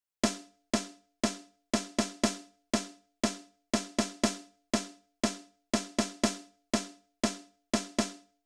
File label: mono raw